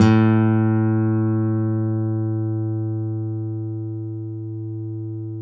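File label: nylon, FLAMENCO, Guitars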